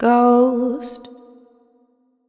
Ghost, Vocals, Wet; GhostHack

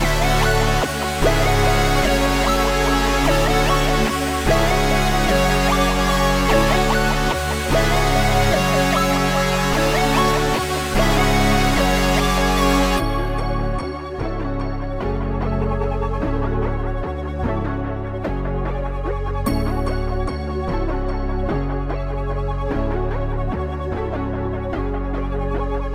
148 BPM F# Min; Man On Fire; Wavy

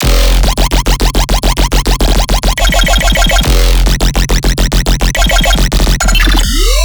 Riddim Bass Loop F 140; Heating Up; Ghosthack; Riddim Essentials Volume 2

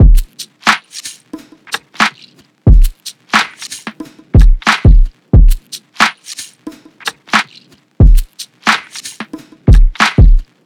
Neck Snap Drum Loop 90 BPM

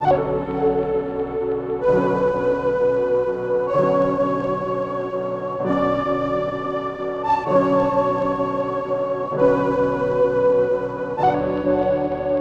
Various Melody Loop 129 BPM F# Min; Cymatics; Oracle Sample Pack

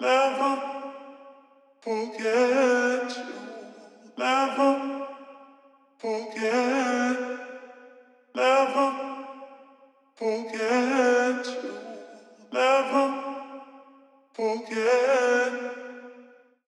Vocal - 115 BPM F# Min; Cymatics; Orchid Sample Pack; Vocal Loops

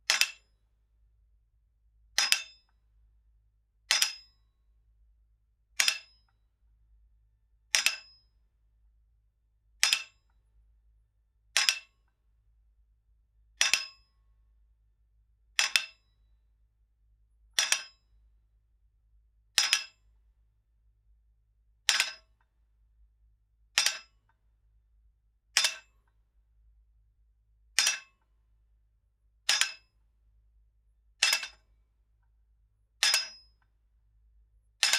Lifting Metal Weights Various; Fairlight Sound Lib

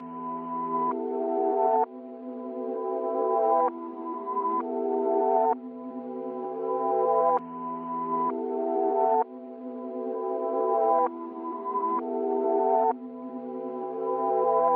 Reverse Melody Loop - 130 BPM E Min Swelling Pad; Loop Stems; Cymatics; Oracle Sample Pack

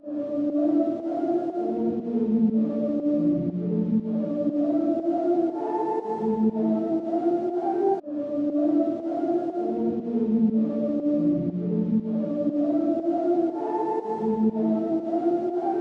120 BPM D Min Main Pad, melody loop stem; Trap; Loop Stems; Custom; Cymatics; 2022 Melody Collection; Trap Pt 1

